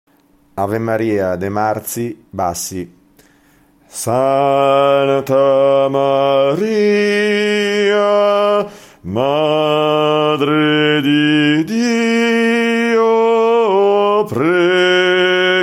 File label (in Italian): bassi; Ave Maria De Marzi